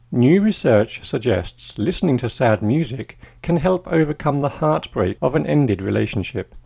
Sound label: sad music